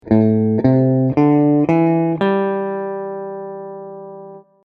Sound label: minor pentatonic